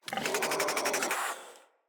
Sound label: Infested Charger Idle